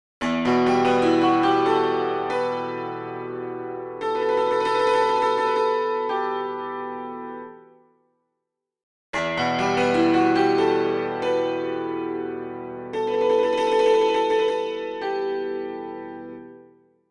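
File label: strike point, pianoteq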